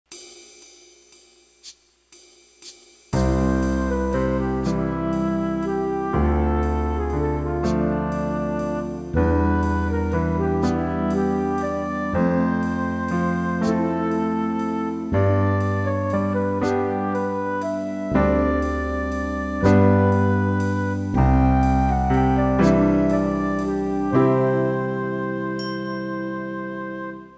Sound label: Pedal Study Slow, piano